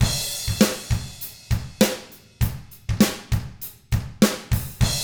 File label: ROCK, Drums; ILIO; Double Platinum Drums 1